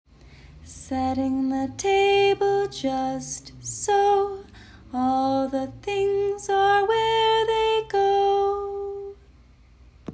Table setting song

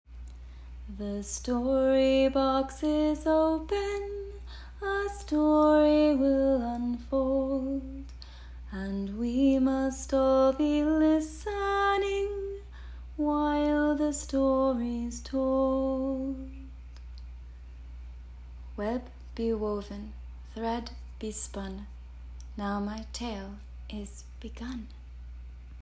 Story opening song verse

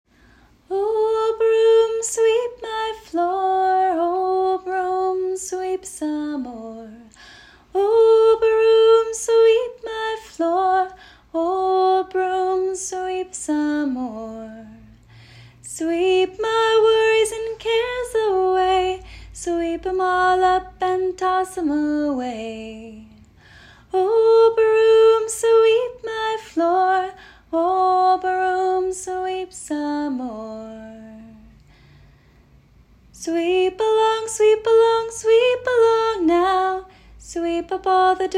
Sweeping song; Daily and weekly songs/verses; bowertreepreschool

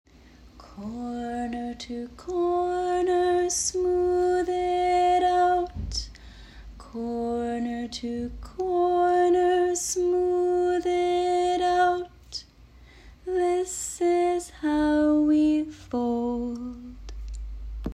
Folding song; Daily and weekly songs/verses; bowertreepreschool

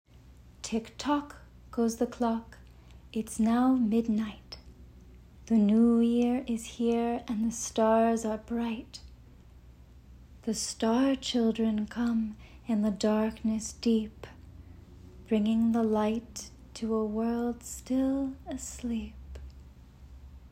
Star Children Verse

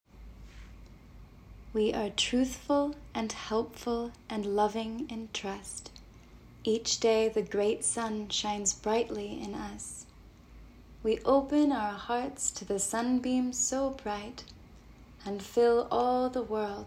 Morning verse

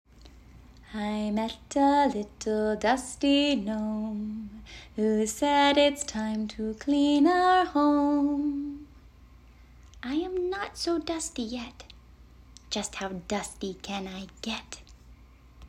Dusting song; Daily and weekly songs/verses; bowertreepreschool

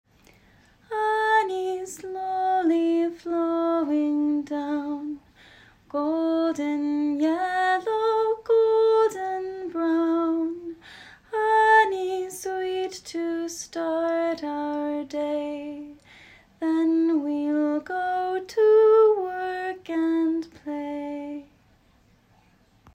Honey drizzling song

